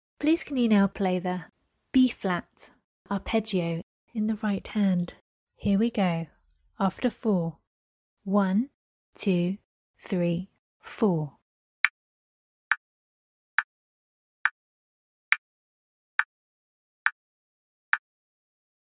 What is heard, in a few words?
b flat arp rh